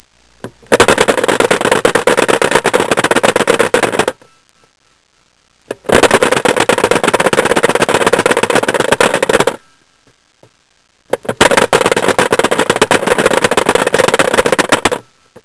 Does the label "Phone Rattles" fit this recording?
Yes